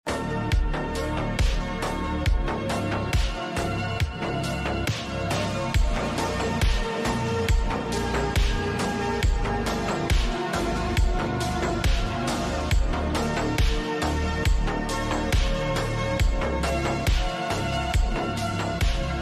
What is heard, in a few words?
Sad Pop